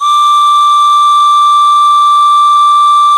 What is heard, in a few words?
SML DANCE, American Natives; E-MU Formula 4000 Series Vol. 4; Earth Tones